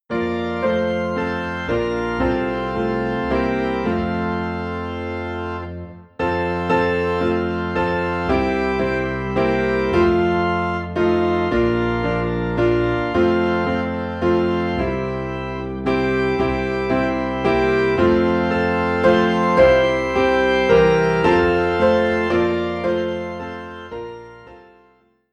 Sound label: Piano and Organ in F; Blest Be the Tie That Binds